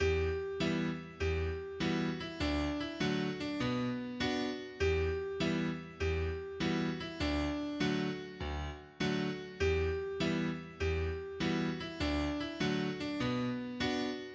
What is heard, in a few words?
Hornpipe; Mr Moore